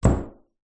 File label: household bathroom cupboard door close